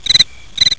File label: Birds; de fuchi